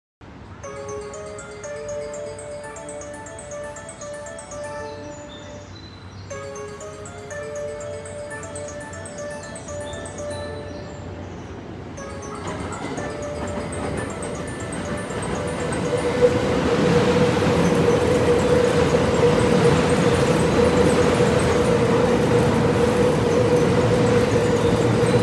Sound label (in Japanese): 大阪環状線通過